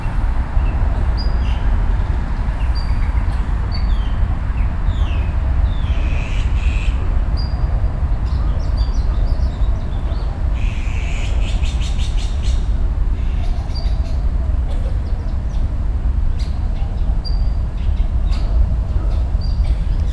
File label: warbler sakhalin leaf Phylloscopus borealoides